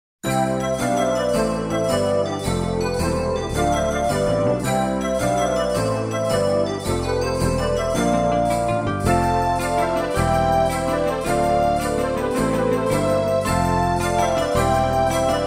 Snowbells; Focus Music

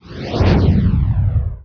whoosh

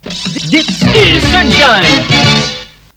sun Shine Jingle; SunshineJingles

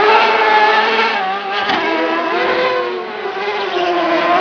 pod ani buzzy loop

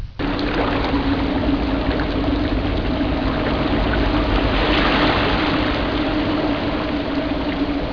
WATER

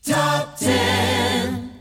jinglepackage; topformat LP jinglepackage 2

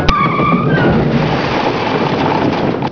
CRASH

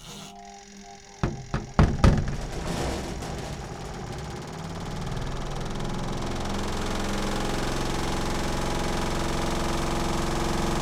DRIER, LAUNDRY; AKAI; 1000 Sound Effects

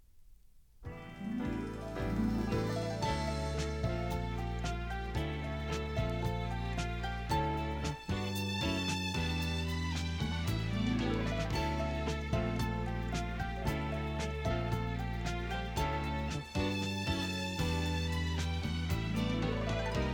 topformat maxi jingle single

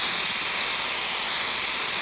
coolant spray loop

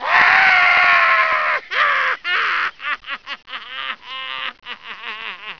AAHHAHA

